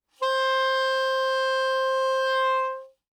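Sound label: Soprano Saxophone non vibrato ff C4; Woodwinds; Bb Soprano Saxophone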